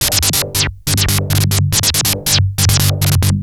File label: Treat Guit, Industrial Nasty